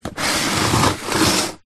industrial tool box small plastic slide on screed concrete floor